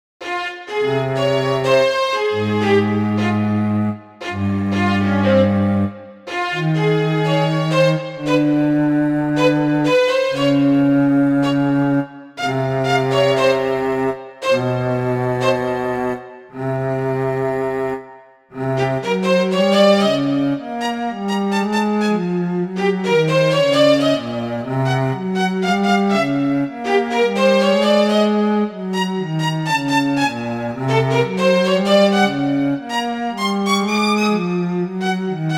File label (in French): class violon; ambiance